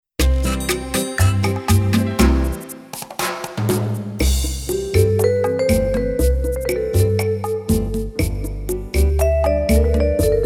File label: Rhumba